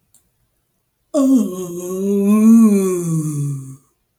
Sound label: Animal Wild animals Roaring cats (lions tigers) Roar, vocal imitation